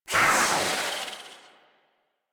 Kavat Vocal Attack Medium; Warframe; Khora

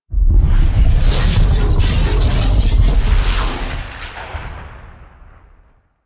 SFX Walls Crumble; Warframe; Parvos Lich Intro

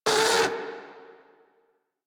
Catbrow Combat Vocal Pain; Warframe

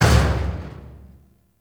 Mech Step Metal Accent; Warframe; Vehicles; NechroMech

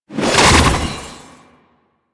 Archwing Prime Sword Short Whoosh; Warframe; Weapons

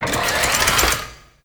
Melee Slam Charge; Warframe; Necramech

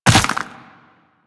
Footsteps Land Ice; Warframe; Tenno

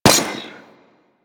Rifle Fire; Warframe; Venus; Venus Hyena Astro; Weapons

